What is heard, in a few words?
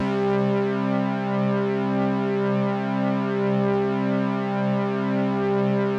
Roland JX3P, synths; OP-Z